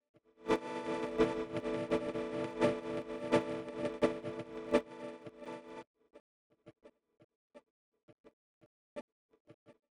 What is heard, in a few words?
chord 1; Sound Source Separation Using Sparse Coding